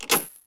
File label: towing latch; Cars; XBOX